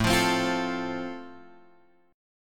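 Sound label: A Minor Sharp 5th, Guitar Drop D, chord strum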